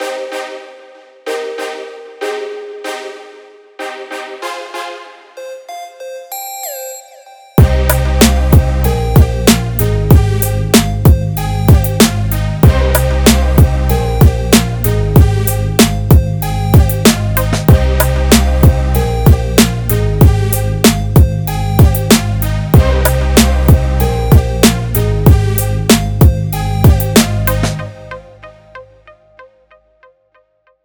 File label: hip hop beat